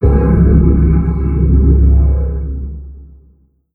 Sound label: shroud eaten planet; ambient; Stellaris